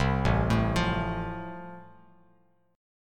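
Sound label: A Minor Sharp 5th, Bass Standard, chord strum; Am#5 arpeggiated; 8,0,7,10; JGuitar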